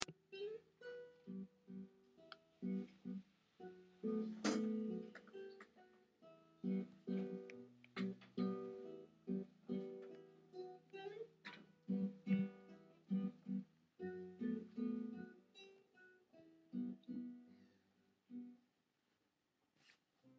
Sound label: guitar solo